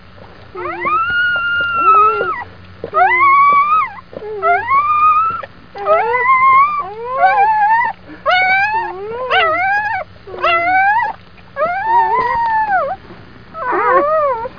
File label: pups, wolf; wolfpark